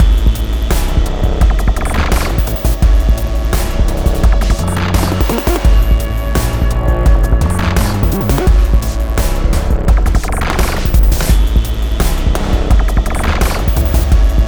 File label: Boss Wavefight; rive wreck hack die retry; ps4